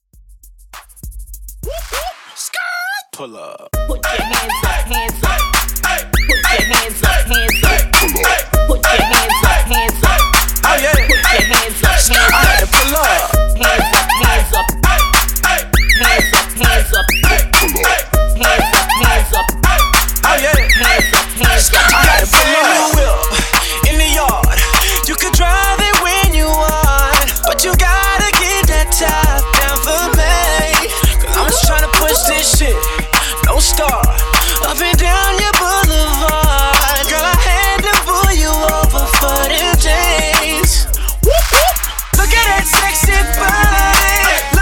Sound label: Re Drum Extended Dirty Intro 100 BPM